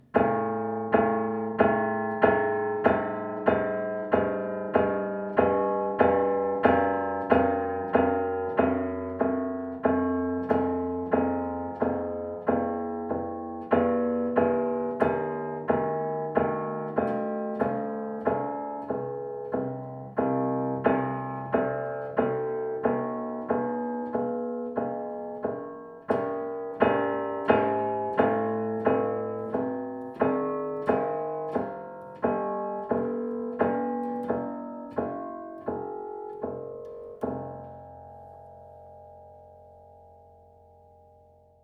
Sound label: Multiphonics of the grand piano, flageolets